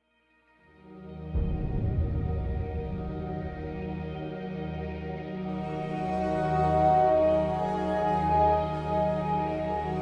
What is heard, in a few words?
Steel Cello Lament